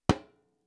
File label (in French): Repinique coup resonant digital